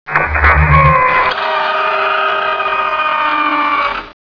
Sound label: panel moves, ambience